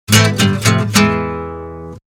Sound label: Acc Guit; PlusFourSix